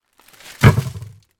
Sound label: french bread baguette in paper pack place on top of bread bin